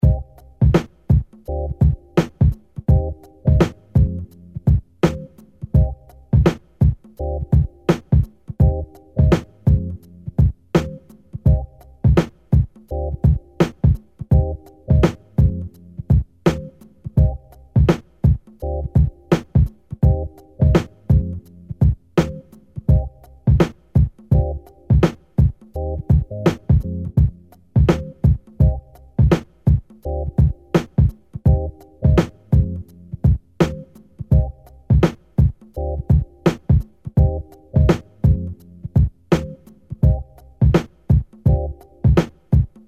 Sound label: Hip Hop Riff